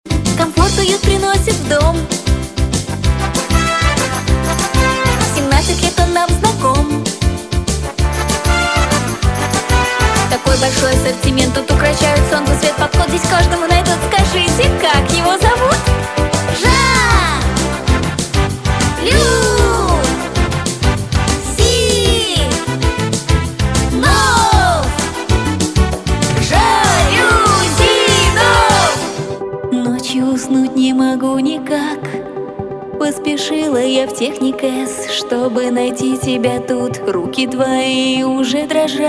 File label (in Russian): vokal